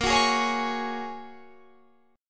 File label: A sharp Minor 7th Sharp 5th